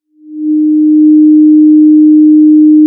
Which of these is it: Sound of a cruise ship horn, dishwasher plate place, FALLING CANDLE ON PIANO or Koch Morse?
Sound of a cruise ship horn